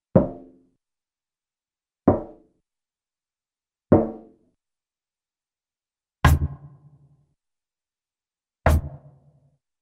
Single Knock On Hollow Metallic - Wooden Surface, cartoon; Hollywood Edge; Cartoon Trax